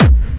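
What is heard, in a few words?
BD Clubby, bass drum; VEC1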